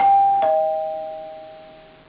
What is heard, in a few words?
DOORBELL